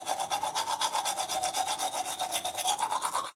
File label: Brush Teeth, Bathroom; 1001 Sound Effects